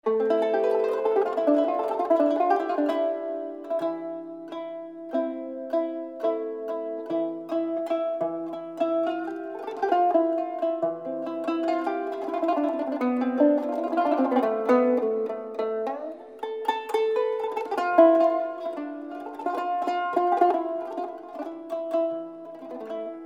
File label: Nihavend Kanun Taksimi